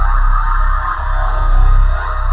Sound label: Loop Wave; KriptoFX; Realistic Effects Pack v1; PARTICLES